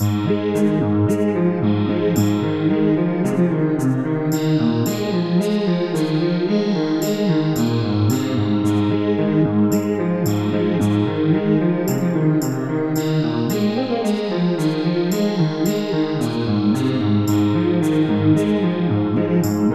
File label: reminiscing; 2020 eclectic music pack